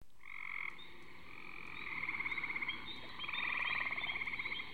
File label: copes gray tree frog